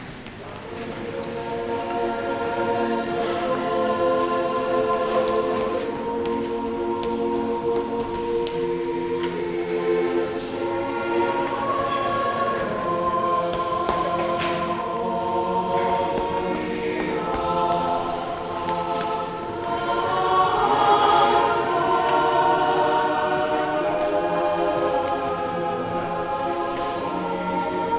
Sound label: Choral